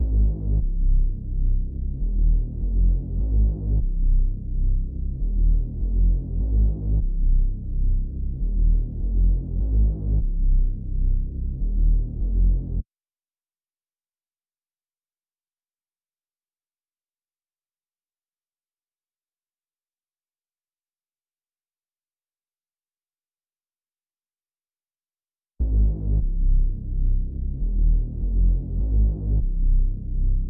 150 BPM C Min Bass; Loop Stems; Infinity Melody Collection; Cymatics - Float

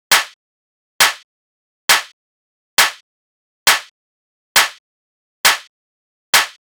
Clap Loop 135, Techno; Ghosthack; Techno Sounds 2025

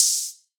Open Hihat; Burner; Cymatics; Trap Starter Pack

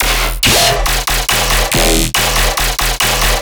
Bass Loop E 140BPM, Dubstep; AC2024 - Day 13 - Dubstep Sounds 2025; Glory Bass

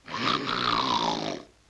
LoFi Synthetic Lion Snore; Comedy Vocals

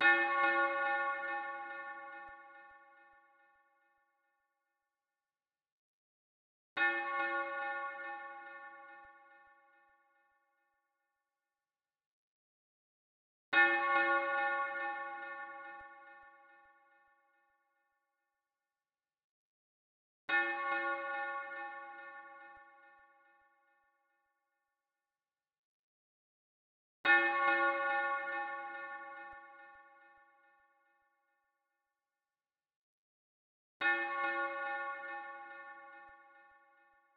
142 BPM C# Min Bell; Melody; Loop Stems; Drill; Cymatics; 2022 Melody Collection - Drill; Consequences

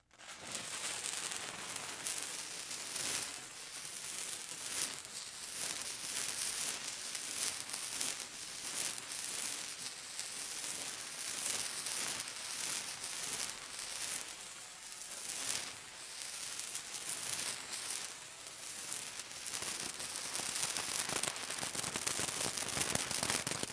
Sparklers Whoosh Popping; Fairlight Sound Lib

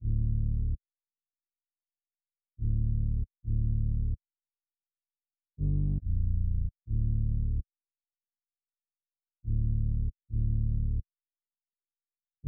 140 BPM D Min Bass, drill; Melodies; Loop Stems; Armed

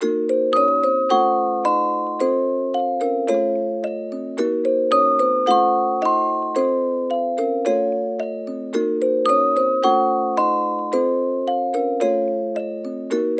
Melody Loop - 110 BPM A# Min, Hip Hop; Cymatics - 9God Hip Hop Sample Pack

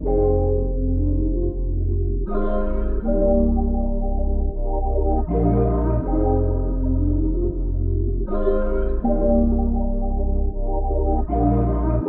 160 BPM C Min, Melody Loops - RnB; Keepsake; Cymatics; Mystery Pack Vol 4

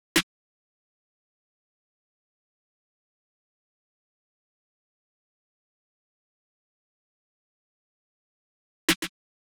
Drum Loop 110 BPM Snare; Cymatics; Vibes II Drum Loop Collection; Avenue Drum Loop

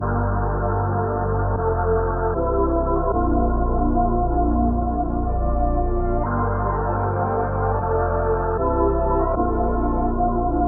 154 BPM G Min, Wavy; Light Speed; Cymatics; Destiny Premium Melodies (BETA)

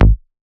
Raw Bass A

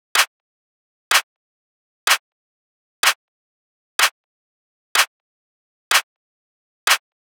Snare 125; Drums; Separated Drum Loops; Snares; Ghosthack; Drum Mega Bundle; Abstract Drums Volume 2

